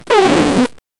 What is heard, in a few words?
powerful toy punch impact, fight